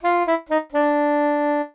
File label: jingles SAX; Kenney's Sound Pack; Music Jingles